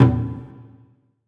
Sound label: Taiko LO, percussion reverb; MARKYDRUMS